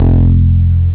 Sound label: Elec Bass; ST-XX Amiga Soundtracker Sample Packs